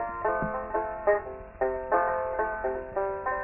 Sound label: banjo